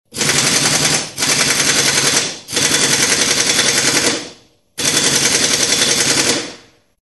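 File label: zvuki pulemeta, machine gun sounds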